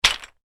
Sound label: toy bricks wooden bag movement; Bag Of Toy Wooden Bricks Movement; Miscellaneous Toy; Toys; McKinneySound